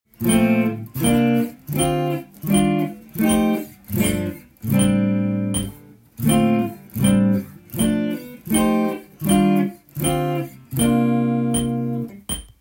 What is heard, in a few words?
chord; stylish chord changes